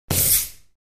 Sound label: Ping Pong Paddle